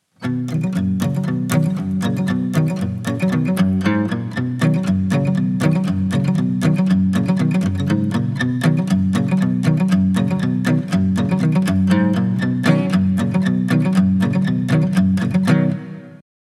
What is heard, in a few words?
ringtone country guitar; Mudita Pure